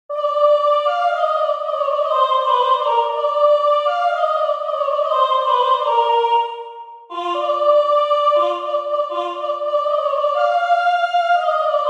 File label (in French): soprane; chorale